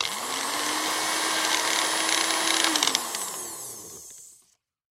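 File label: blender and juicer sounds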